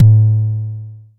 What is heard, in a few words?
analog tonal, Kickbank